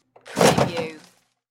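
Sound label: Paper Towel Dispenser Single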